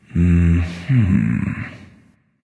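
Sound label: nonverbal agree; smite; voicepack; ullr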